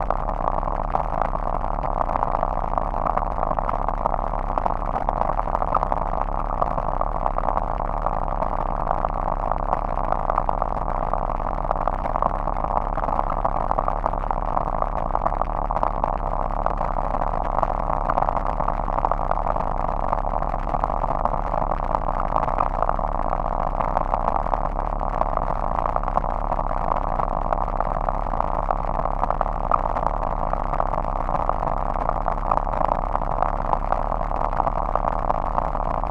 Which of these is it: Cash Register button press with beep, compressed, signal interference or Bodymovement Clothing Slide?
signal interference